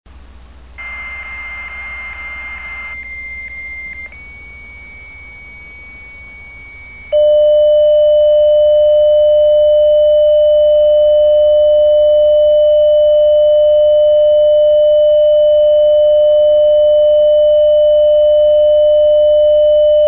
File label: carrier